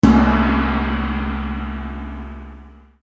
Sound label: gong; Confucius